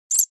animal bat squeak

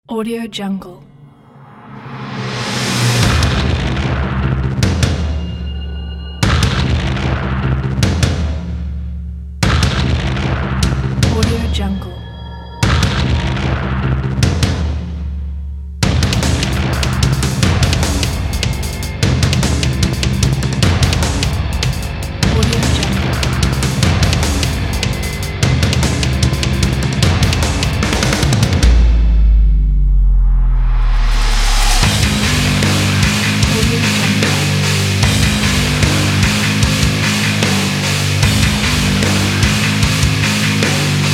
industrial metal trailer

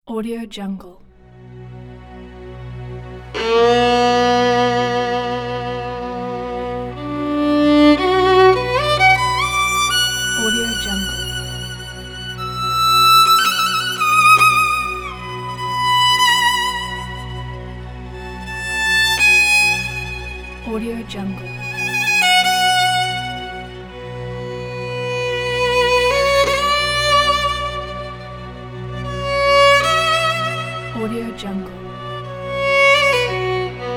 amazing mysterious violin; eddiehoncha

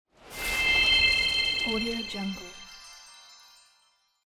christmas snow magic

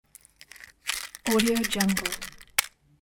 match box shake; lazyboysproductions

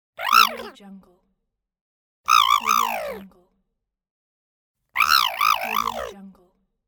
cartoon bird call

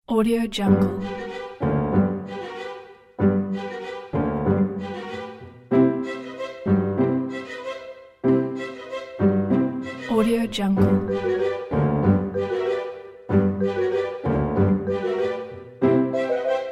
curious cat

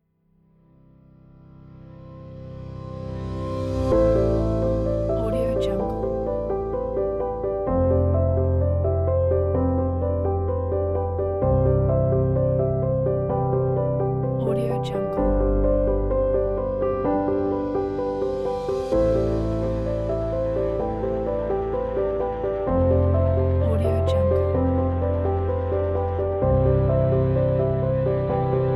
cinematic piano inspiration

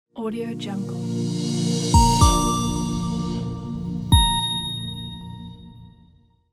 simple digital logo

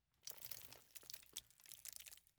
debris peanut shells fine

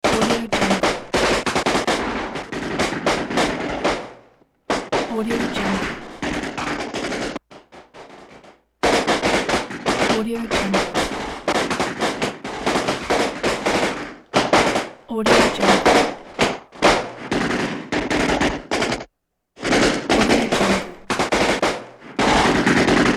gun fight various shots extended; hollywoodedge

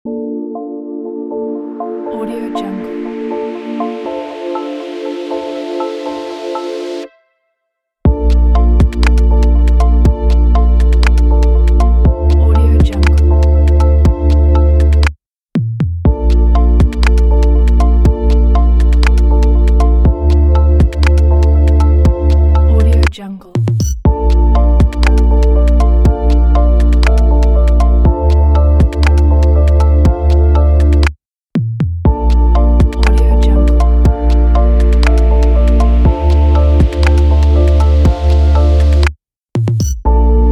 drones

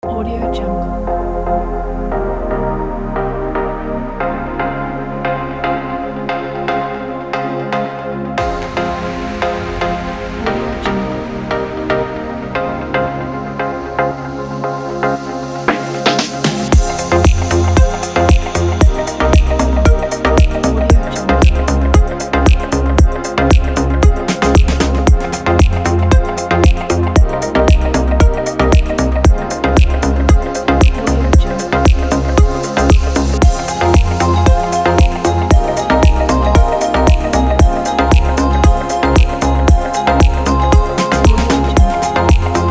tropical game; bransboynd

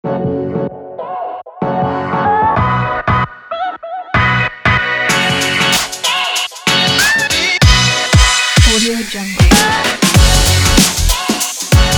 hip hop upbeat

